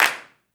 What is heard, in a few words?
clap 1 R, Roland MV8800